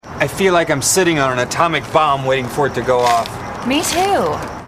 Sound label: atomic bomb; the room